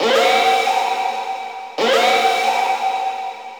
Railjack Alarm; Warframe; Quests; Wraith Quest

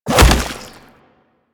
Regurgitate Proj Impact; DE; Warframe; Warframes; Grendel

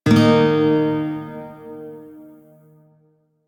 Shawzin Chromatic Chord G, Music; DE; Warframe

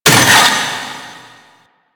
Vaults Stake Gate; Warframe; Entrati

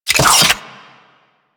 Rolling Drone Turret Levitate Start

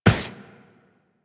Run Default, Footsteps; Warframe; Tenno

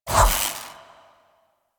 Switch Electricity; Warframe; Wisp

